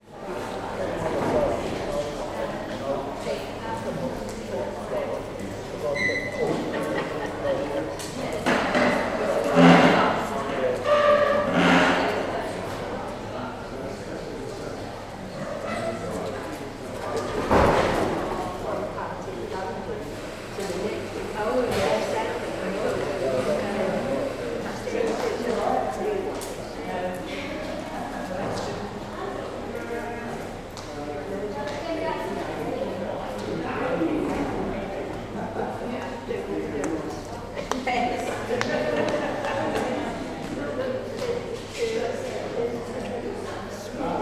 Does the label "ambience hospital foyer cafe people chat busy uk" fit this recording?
Yes